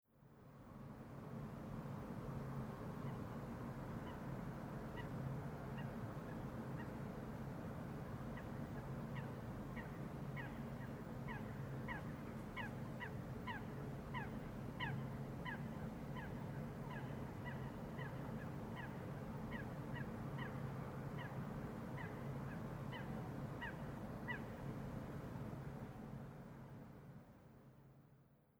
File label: Ducks